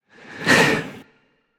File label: breath; smite; hunbatz